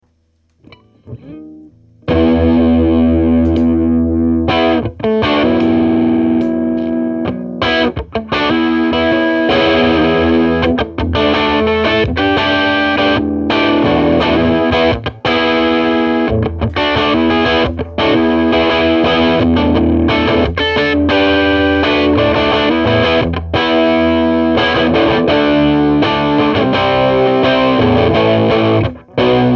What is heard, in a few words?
bassman